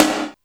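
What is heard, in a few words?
Snare Brutal, Alesis D4 drum machine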